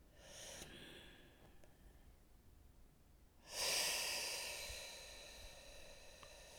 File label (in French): soupir; Index des soupirs; Hadjithomas Joreige